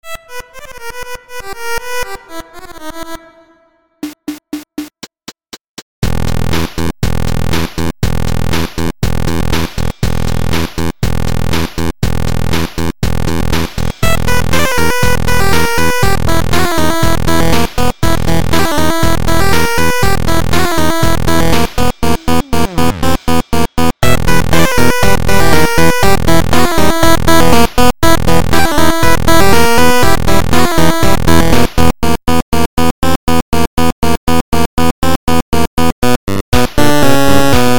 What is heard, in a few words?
Crash Polka